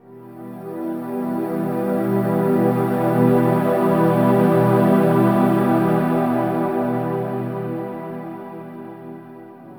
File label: Vox Orch Chords; Drones Abstract